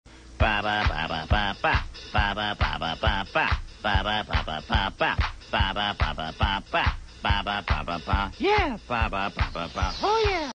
sheep conga; disco

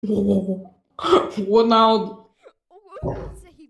hohoho; ronaldo

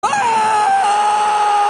aaaaaaaaaaaaaaahhhhh

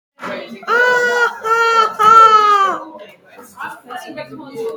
laugh

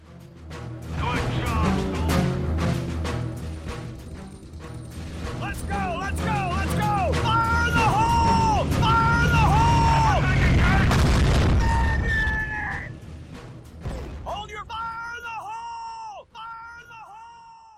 fire on; battlefield

